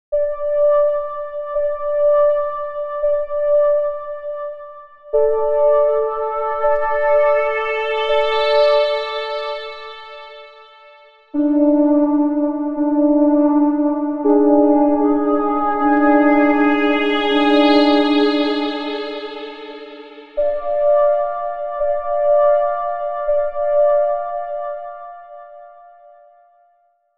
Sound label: Cool Morning Ringtone; LightWave Ringtones; Ringtone Records